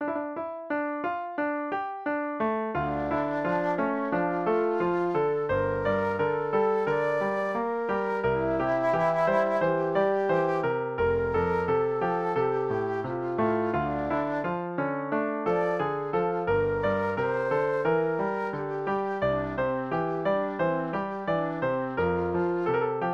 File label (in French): une flute; pour ondine